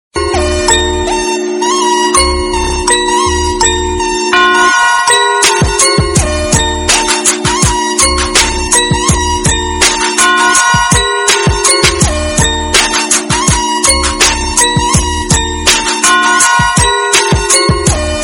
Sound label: cool arabic background music tone; iphone ringtones